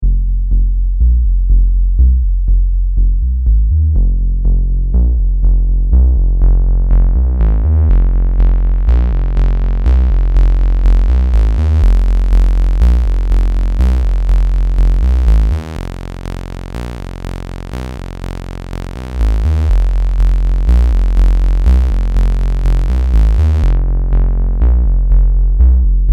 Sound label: Moog Mother 32 Saw Tri Sub Osz